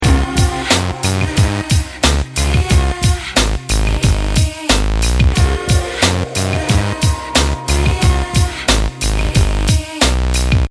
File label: loop